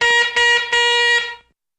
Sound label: Single Car Horn Honk; Various Single Car Horn Honks, Beeps, Chirps, Etc..